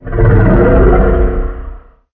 Laser B Sustain

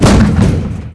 All Projectile

